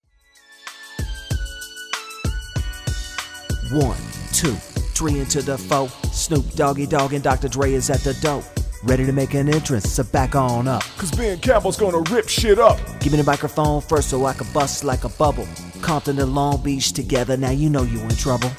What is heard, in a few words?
Sings